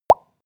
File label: pop bubbles; soundpool